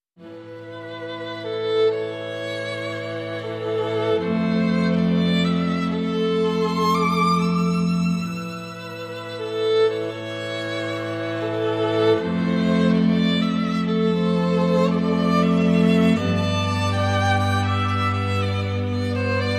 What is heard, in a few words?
Strings Only